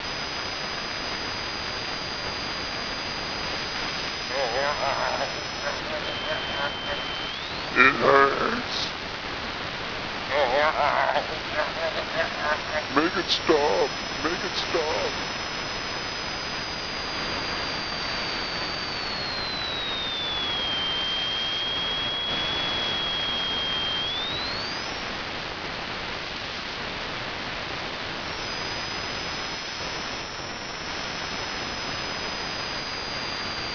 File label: dist pain